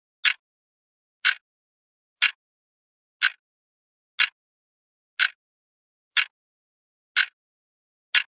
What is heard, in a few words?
Clock Tick Tock